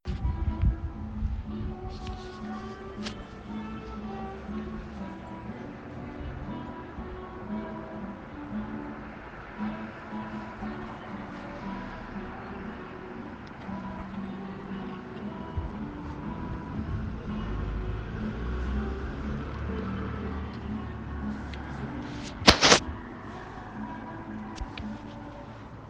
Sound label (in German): Atmo Freude schöner Götterfunken